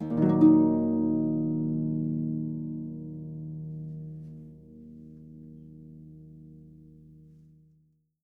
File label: D#, Percussion & Harp; Miroslav Vitous